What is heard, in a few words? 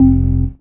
DM ORG1, Dance Mega Synth